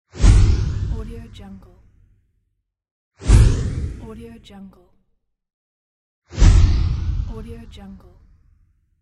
big swish; transitions and movement

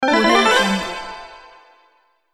bright right answer; cartoon

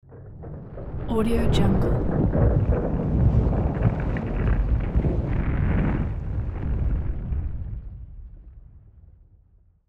earthquake with rockslide; رانش سنگ

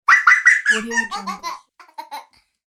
little girl laughing; human sounds

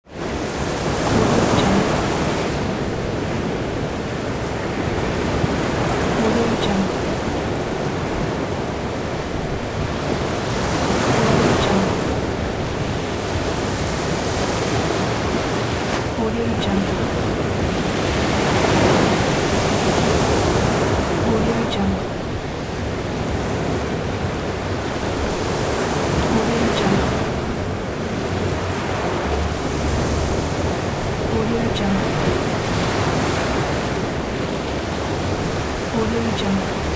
sea waves

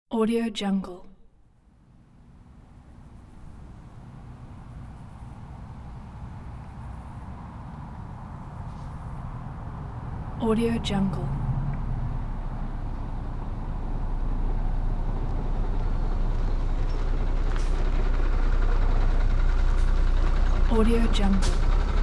bus long arrival and parking

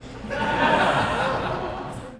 crowds theater laugh theatre 500 people amused; خنده جمعیت در سالن تئا; human sounds

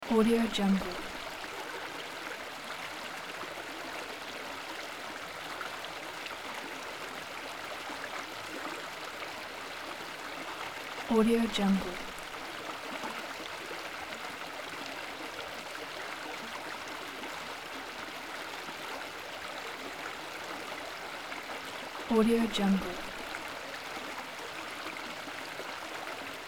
stream medium fast bubbles splashes birds

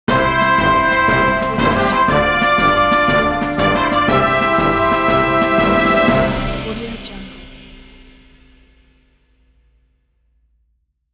big brass fanfare; game; مارش بزرگ پیروزی

